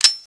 SHOT OUT; OUTLAWS

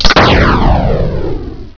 Missle Launch; Eat My Photons!

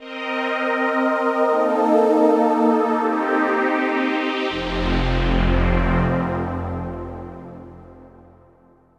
SYNTH PAD AMBNT 160 C, Ambient; eJay; Sphere

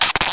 jaw crack; charley cat corral quarrel

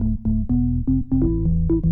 ORGAN HOUSE 125 A; eJay; Keys

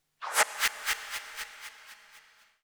FX, ambient, 160; eJay

